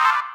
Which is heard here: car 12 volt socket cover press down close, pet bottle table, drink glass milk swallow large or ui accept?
ui accept